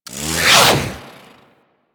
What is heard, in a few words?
Kusarigama Plasma Whoosh; Grn Kusarigama